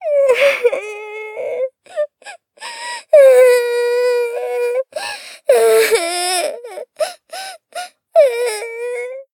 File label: Cry; Rei Yumesaki